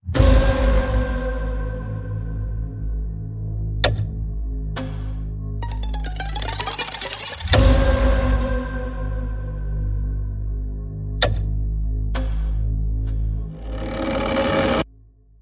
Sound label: Itsy Bitsy Spider Horror No Melody